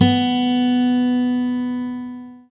GUI Ac., GUIT AC; Best Service Gigapack 2

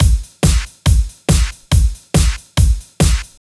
Kick Loop, 140bpm

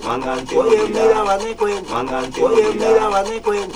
PYGMY LOOP, Ethnic; Zero G - Ethnic

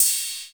DANCE RIDE, Drums Dance & Groove; USB Soundscan vol.11; AKAI; DANCE KIT

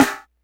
snare, Hardcore Breakbeat; Big Fish Audio; Hardcore Breakbeat Action; Gone Insane

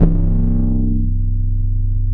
BASS R, Drum & Bass 160 BPM; Ueberschall; Drum & Bass Flash Trackz

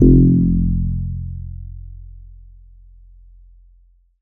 BAS F1, Yamaha TX7 synth bass

